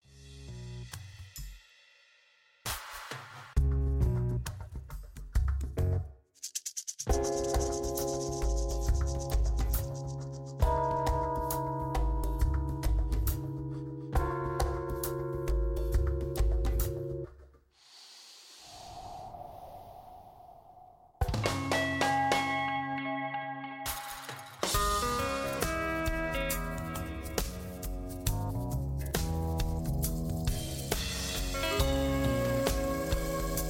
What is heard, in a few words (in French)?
Instrumental